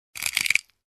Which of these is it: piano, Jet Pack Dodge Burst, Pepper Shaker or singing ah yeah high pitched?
Pepper Shaker